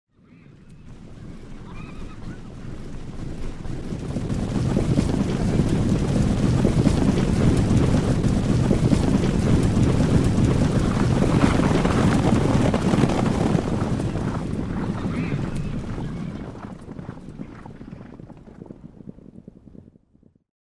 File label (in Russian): tabun bezhit mimo 3 nizliy